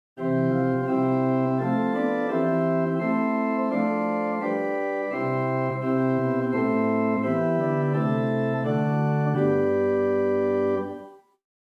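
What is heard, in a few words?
Swell Rohrflute 8 + Gemshorn 4 + Principal 2; First Baptist Riverside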